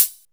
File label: nice hi hat g key; drum single hits one shots